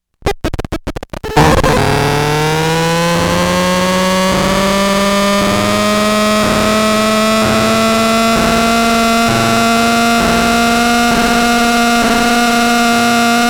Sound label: Whistling